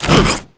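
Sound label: Fog Damage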